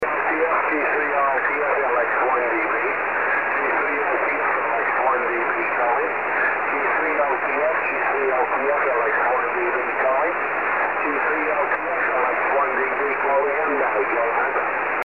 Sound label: 13cm ssb